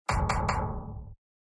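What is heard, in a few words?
knocking door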